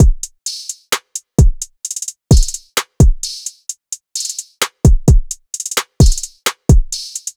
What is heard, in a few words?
Full Drum Loop 130 BPM, Hip Hop; Cymatics; Cobra Hip Hop Sample Pack